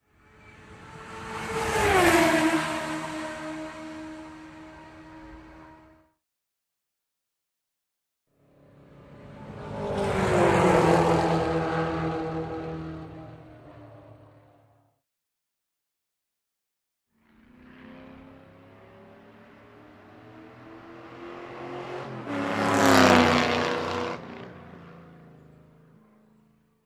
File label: Three different sports cars passing by; Три разных спортивных автомобиля проезжают мимо